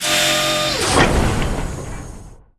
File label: Elevator Switch Stepped On